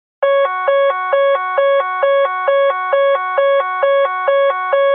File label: lock; rwr; war thunder